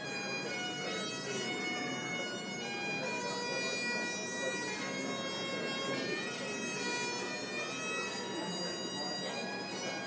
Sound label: international airport heathrow rings with bagpipes; a new departure; thesnp